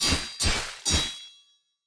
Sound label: attack; Skillzgaming; battle Mania Desktop